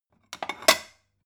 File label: stone bowl shelve hard